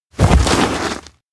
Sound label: trip ground; anemoi